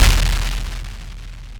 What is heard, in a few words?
explosion; TankOp.activity